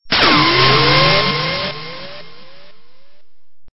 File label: Stinger; STINGERS